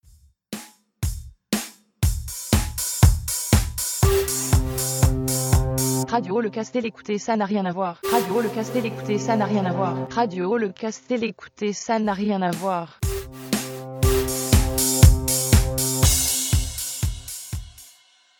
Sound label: Jingle